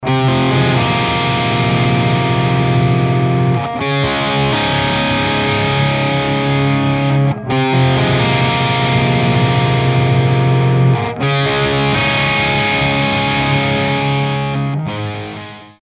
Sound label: greenback git